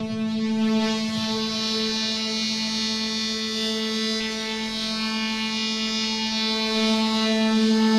cable destroyed; Half Life Alyx; music; vault

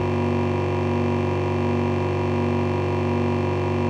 substation hum; nuke

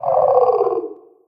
waiting call, headcrab reviver

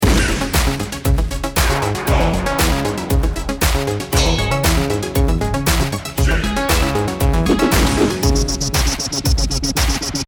round ten sec count

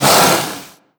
hiss long, headcrab reviver; creatures; vox

